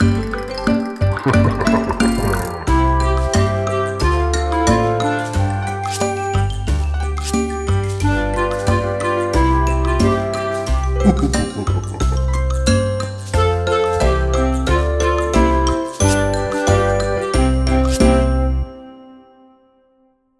plas bez wokalu; szesciolatki; multiprzewodnik; akomp

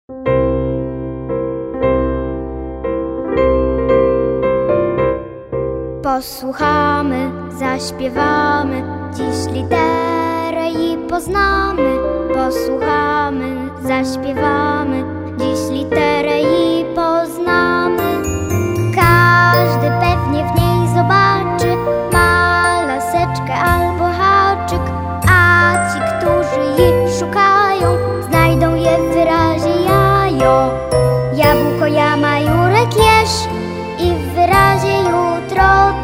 piosenka litera j; szkola marzen na tak; klasa 1

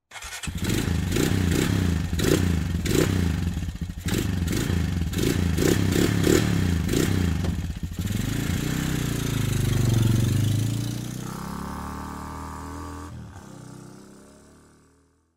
quad, karty obrazkowe; w drodze do celu

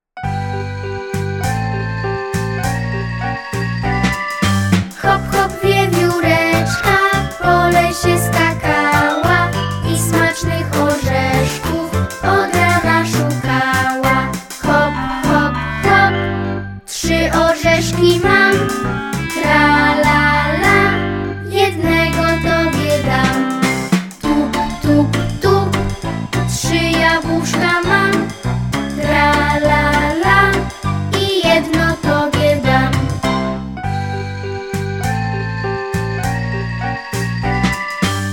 pios wiewiorka i jez; trzylatki; multiprzewodnik

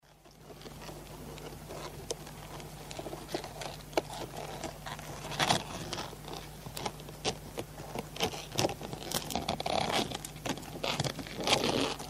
krab; karty obrazkowe; morskie opowiesci

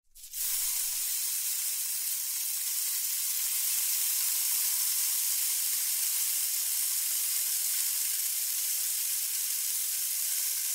klepsydra; karty obrazkowe; nowy rok

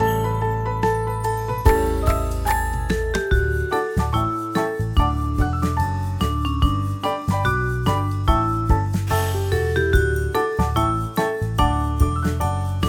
plas bez wokalu

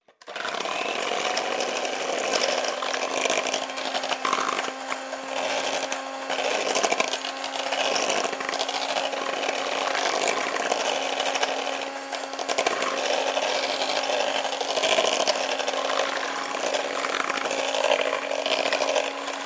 mikser; karty obrazkowe

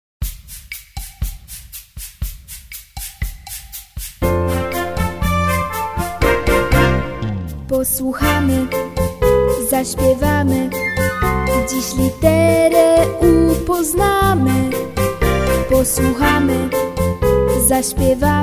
piosenka litera u; szkola marzen na tak; klasa 1

